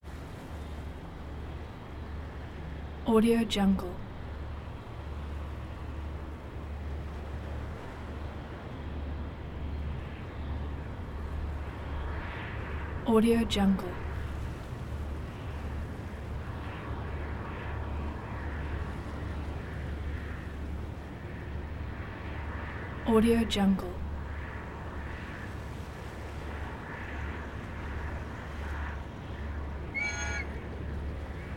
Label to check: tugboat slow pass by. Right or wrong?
Right